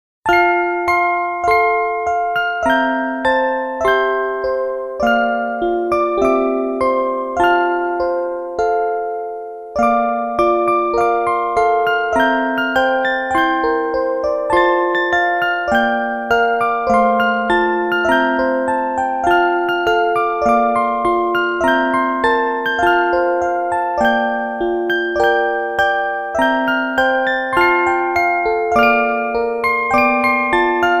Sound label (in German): orgel